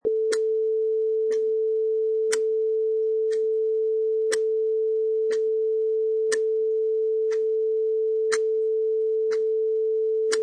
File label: Clock Ticking